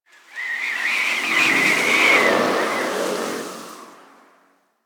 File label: Landscape Wind Gust Med, Ambience; One Offs; Warframe; Venus Open World